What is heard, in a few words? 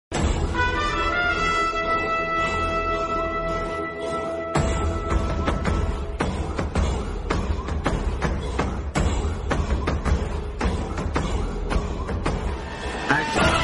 Theme Music Tamil; Asuran